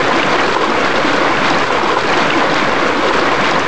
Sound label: water run heavy; mesa facility